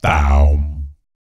BS, Vocal BASS FX

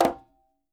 quinto; Sampleheads; NYC Percussionworks

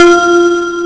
bell; Amiga Soundtracker Sample Packs

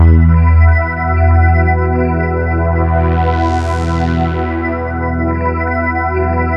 TG500, synths; ASTER TG500; Rubber Chicken Software Sample Bank

